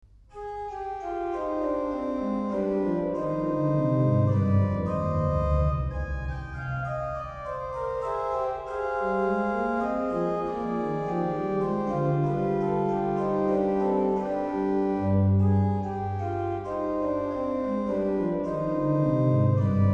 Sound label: partita vii, Organ; Bach; Great Organ Works 3